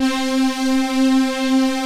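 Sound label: symphonic strings; STRING+CHOIR; Pro-Rec; Synthsonic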